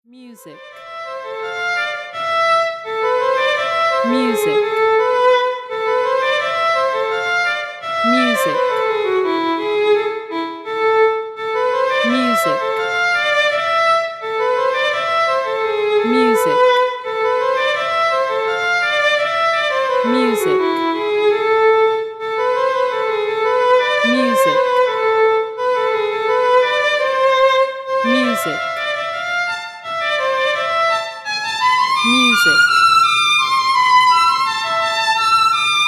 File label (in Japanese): 物語テキスト動画BGM